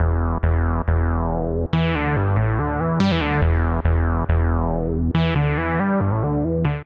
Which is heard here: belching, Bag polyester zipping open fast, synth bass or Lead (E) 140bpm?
Lead (E) 140bpm